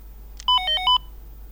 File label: Siemens x45 Ton